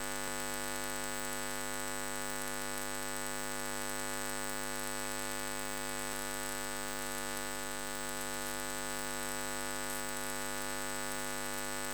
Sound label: Electromagnetic Engine Pool Loop; Mono; Essentials Series NOX SOUND